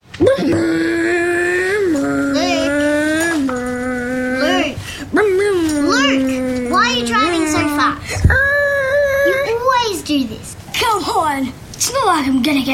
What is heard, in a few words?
strings